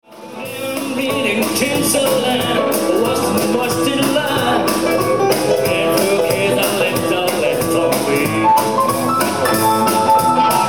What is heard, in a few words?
guitars